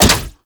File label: Ball Catch; Warframe; Missions; Tenno Ball